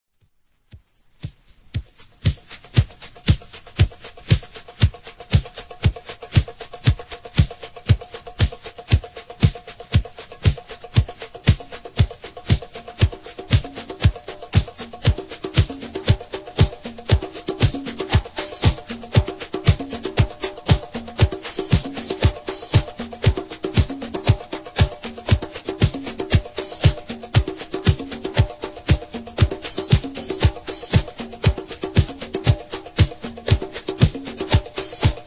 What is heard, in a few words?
disco mix